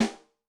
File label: Buzz ring, Yamaha DTXpress-II snare